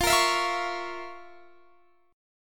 F Major 7th Suspended 4th Sharp 5th F, Guitar Standard; FM7sus4#5 strummed; JGuitar